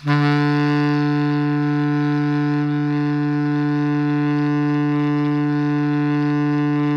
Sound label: BARI PP D2, saxophones; Greytsounds - Philip Wolfe David Crozier's Saxophones